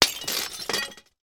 impact glass window smash; sound effects one

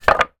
wood blocks small x2 place down on others; foley; zapsplat

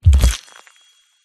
Impacts and Hits Exploding Fairy

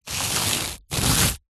foley dirt thin layer scrapes

